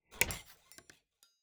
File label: block upgrade building